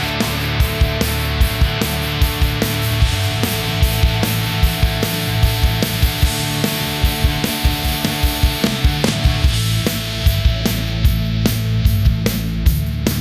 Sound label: electric drive anthem